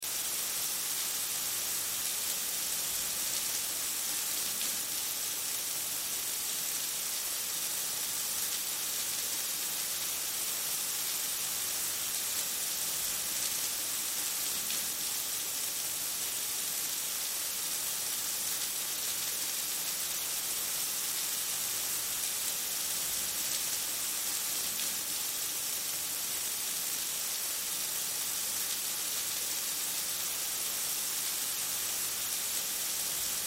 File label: Shower On Run Off, Shower Turn On Run Off Outdoor Camping Public Pool Loop; Bathroom; Water; Blastwave